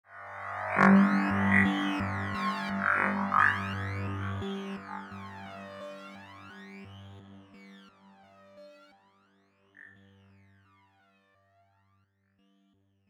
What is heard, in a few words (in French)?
Interlude